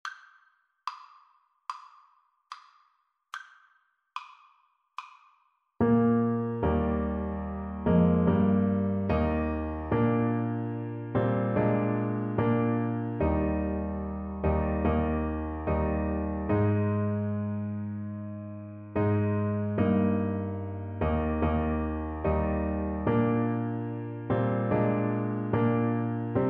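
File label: auld lang syne, alto saxophone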